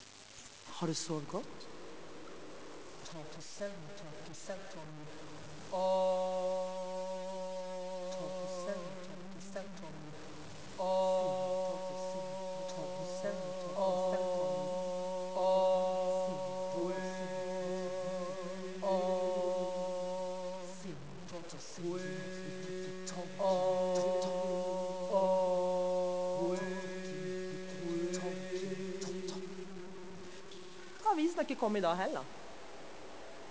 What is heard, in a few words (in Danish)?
Sirene